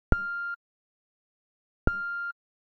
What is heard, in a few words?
beep